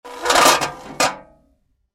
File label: suit of armour entrance